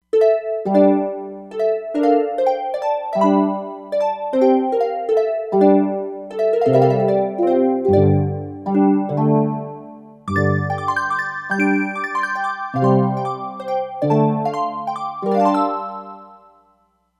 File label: Harpluck 5th, E-mu Proteus-3; Factory patches